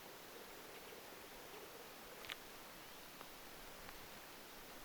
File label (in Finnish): urpiaislintu saaressa